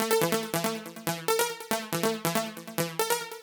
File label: ELECTRO SYNTH 140 A; Keys